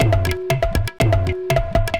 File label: Tabla